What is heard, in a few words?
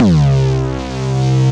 Iron Hand Bass, Synth Bass; Ableton; Factory Packs; Beat Tools